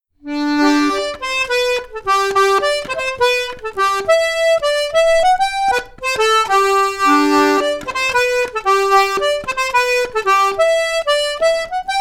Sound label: Harmonica Hohner Goudbrand in DG, Jig; The Sherbourne Jig; Staalkaart